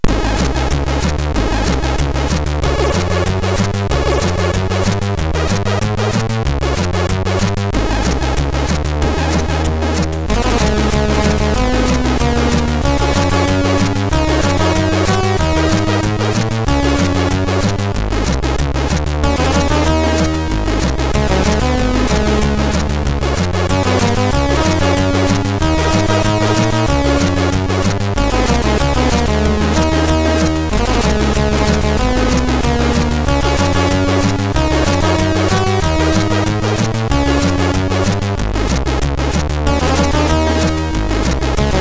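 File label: Rock n Roll, MOS 6581R4 SID chip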